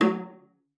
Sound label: F#3, VIOLA FINGER; Xsample VOL-5